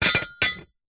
impact metal object bracket plate set down hard surface